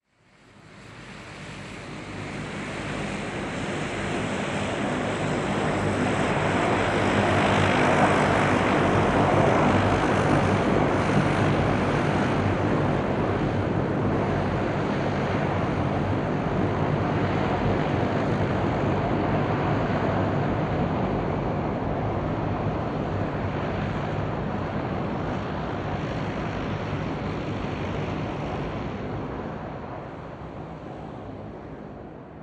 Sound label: Airbus Flying Over